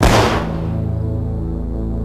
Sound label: death; singe